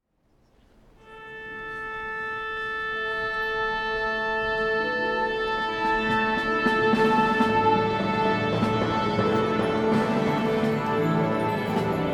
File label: Orchestral Tuning